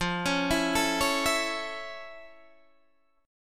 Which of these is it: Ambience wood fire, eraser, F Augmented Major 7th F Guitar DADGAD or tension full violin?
F Augmented Major 7th F Guitar DADGAD